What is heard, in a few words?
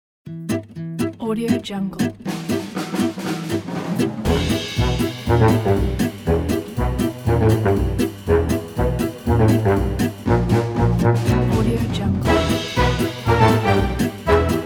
big band